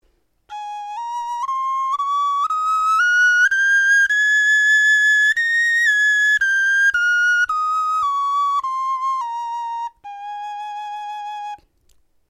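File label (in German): tonleiter, Knochenflöten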